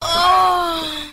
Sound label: "oh", ORGASM